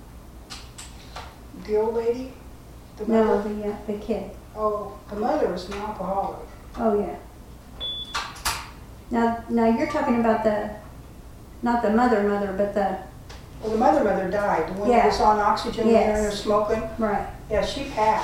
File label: strange laughing; N Vine St Splfd